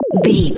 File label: retro misc bass sound